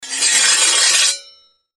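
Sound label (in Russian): sword drawn from scabbard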